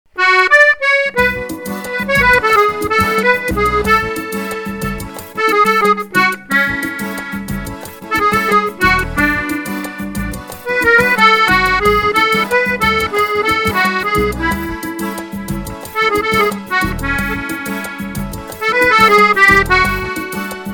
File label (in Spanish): cumbia